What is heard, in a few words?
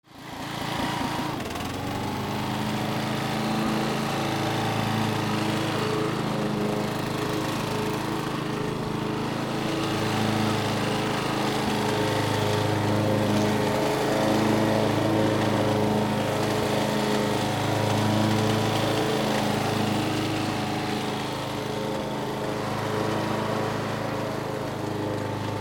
VEHICLES